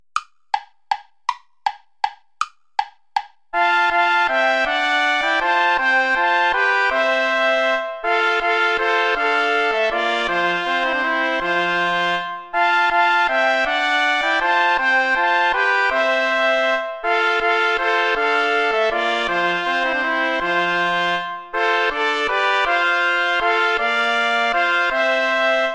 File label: play along without trumpet; vulpius melchior; lobt gott den herrn ihr heiden all